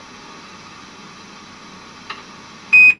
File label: fx radio, Cartoon Film Effects